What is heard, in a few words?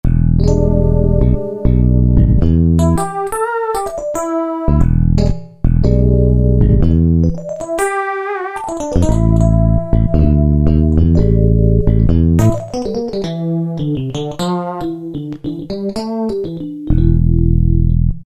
Basin Strat Blues, Roland D-50; Factory preset demos